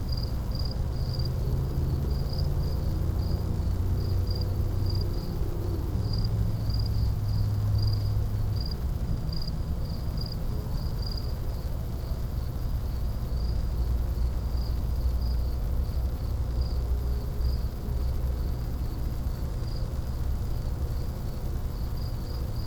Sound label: Urban night electricity cricket; urban and suburban; boom library